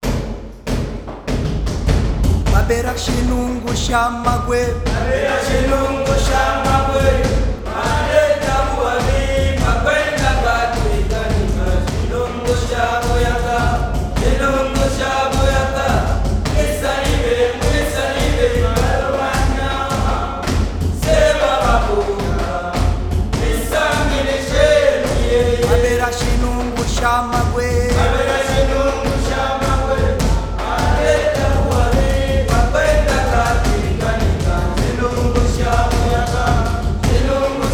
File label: Shinungu Chant Yeke; yeke songs